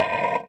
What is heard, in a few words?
Bulldog clamp